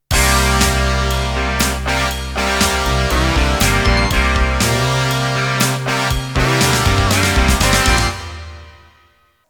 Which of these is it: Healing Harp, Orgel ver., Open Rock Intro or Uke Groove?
Open Rock Intro